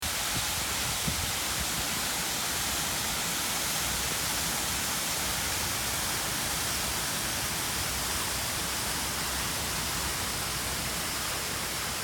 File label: Waterfall sounds